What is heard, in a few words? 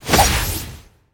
BOSS Atk; Fabao